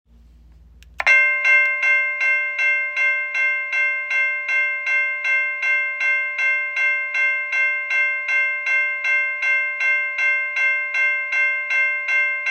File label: US&S E Bell